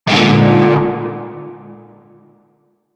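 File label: Shawzin Minor Chord L; Music; Warframe; Narmer Shawzin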